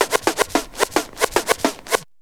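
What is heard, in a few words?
scratch, record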